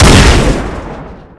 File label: Shot; svencoop